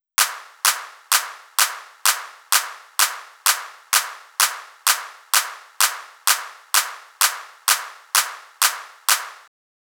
Clap, Drum Loop 128BPM; VEC5 Drumloops; VEC5 Multi Loops 128BPM; Loop Elements

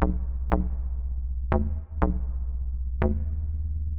SH101 Bass Loop (120BPM), retro house

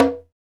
PRC CONGA, Roland; Northstar - Drumscapes Roland; Bonus Perc; Congas

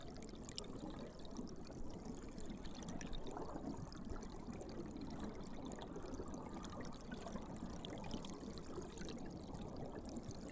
Hydrophone, Underwater, Bubble; underground sounds

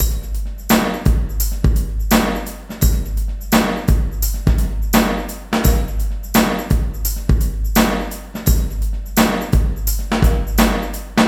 WET LOOP 85

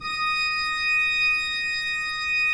pipe organ, 3-D Audio

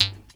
KHOMUS M, KHOMUS NT; USB Soundscan vol.60; Asian Traditions; AKAI

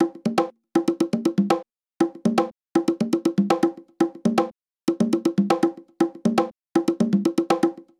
120 hard congas, Nu Jazz House; PowerFX

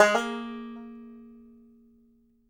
BANJO NOTES, BB3; Sampleheads; Whole Lotta Country 1 & 2

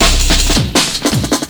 LOOP SD, Drum & Bass, 160 BPM; Ueberschall; Drum & Bass Flash Trackz

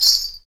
METAL, Afro Shakers, Ankle Pods

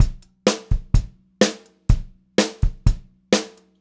126 PO, Pop-Rock Drumloops

